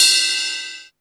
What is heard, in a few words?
RIDE BELL, CYMBALS; CYMBALS 1